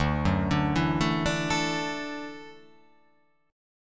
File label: D Major Add 9th, Dadd9 chord; arpeggiated; JGuitar